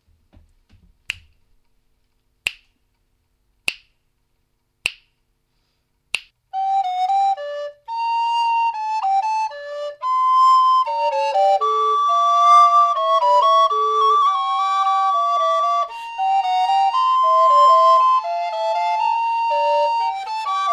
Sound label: Bach alto tenor